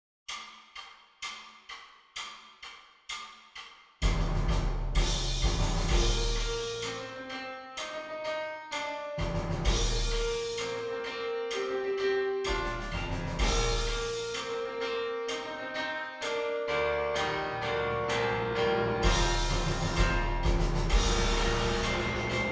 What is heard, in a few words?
Fanfare Flex Band Perc Only 8 beat intro